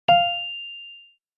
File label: notification